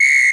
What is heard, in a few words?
Whistle L, percussion; MARKYDRUMS